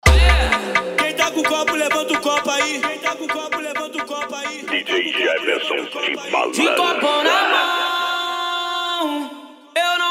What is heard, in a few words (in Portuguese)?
summer eletro hits mais funk